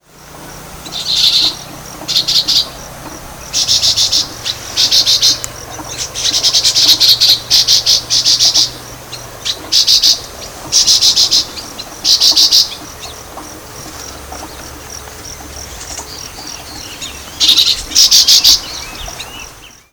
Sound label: Euphonia pectoralis, Bertioga, Brazil; SP; Silveira